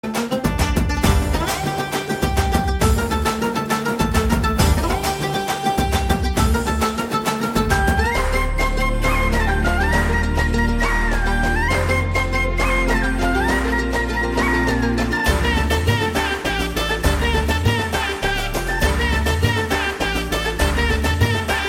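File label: background music; Naadodi Mannan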